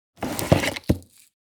Objects office cardboard box take an object out

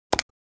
Large button

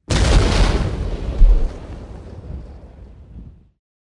Massive explosion close perspective

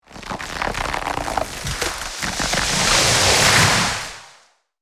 Nature magic spell nature manipulation

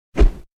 Short deep whoosh

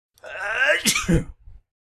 Sneeze male fifty year old

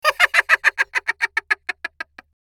Crazy giggle laugh; shorts sound effects

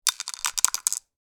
Pill container putting pills inside; medicine

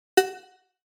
Futuristic button

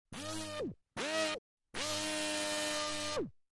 Robot arm moving

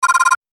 Digital interface short scroll menu ui

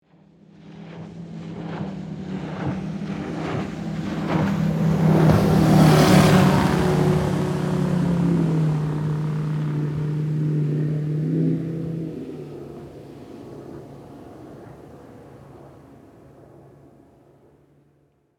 Motorboat passing by close perspective

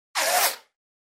Bullet pass by

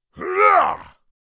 Orc creature attack

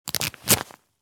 Bulletproof vest straps velcro fasten; military equipment sound effects

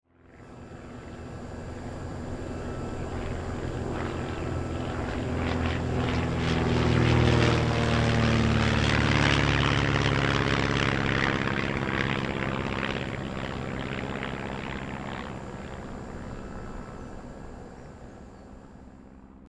Airport of Johannesburg light aircraft pass by

